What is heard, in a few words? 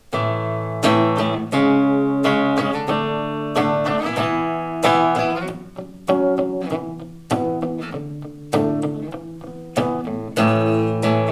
distortion